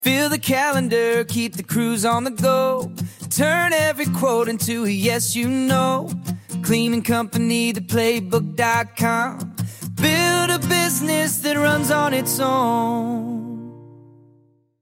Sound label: jingle